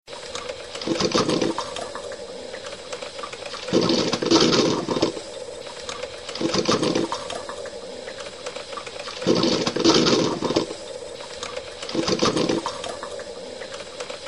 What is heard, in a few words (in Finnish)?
kahvinkeitin